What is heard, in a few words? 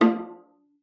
F3, VIOLA FINGER; Xsample VOL-5